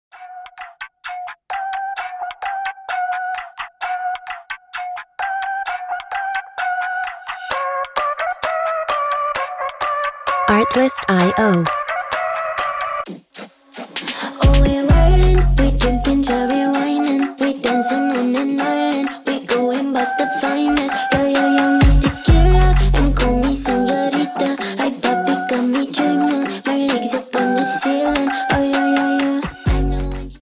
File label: Ringtone